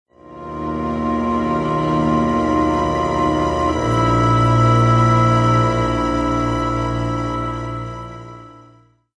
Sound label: Industrial Foreboding; Civilization Revolution; Xbox